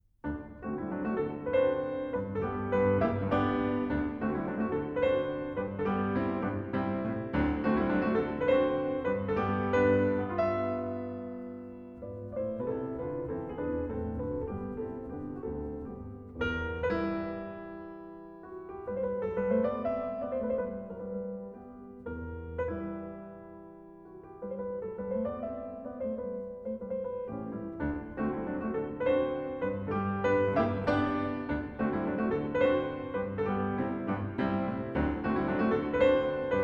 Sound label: Mazurka In G; Chopin